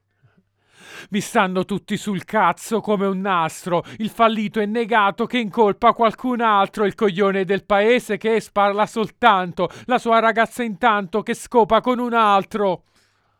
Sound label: vocal